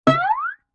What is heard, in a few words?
Guitar Plink Slide; Vintage Cartoon; Musical Elements